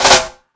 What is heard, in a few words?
FX Sounds, Drum Machines E-MU Pro-Cussion